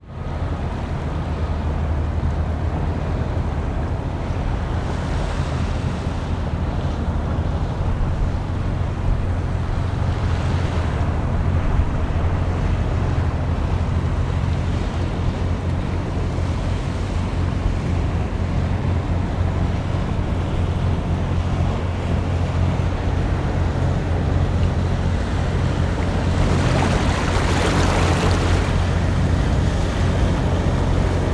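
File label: ambience harbour waves lap onto jetty boat passes drone of city in background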